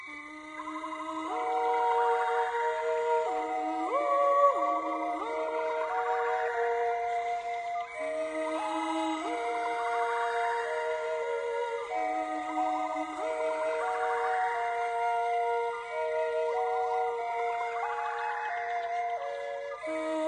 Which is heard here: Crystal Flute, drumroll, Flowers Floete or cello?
Crystal Flute